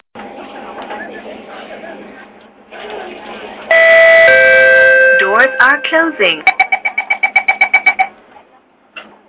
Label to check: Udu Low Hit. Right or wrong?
Wrong